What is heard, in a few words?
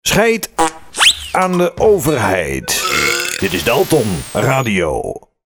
Jingle; Audio Fragmenten; Arkan Radio 2